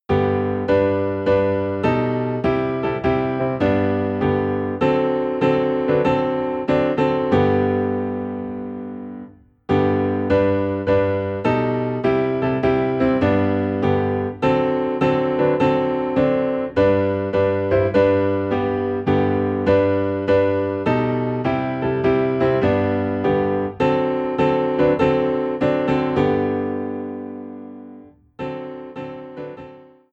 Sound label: Piano in G; Tell It to Jesus